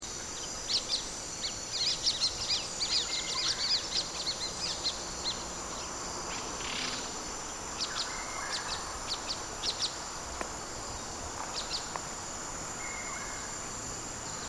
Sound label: blue rumped parrot